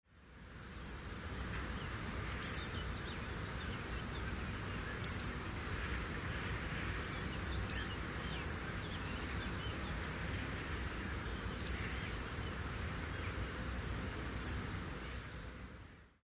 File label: grey wagtail nocmig